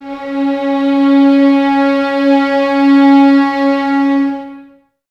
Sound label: violins C#4, string ensembles; Miroslav Vitous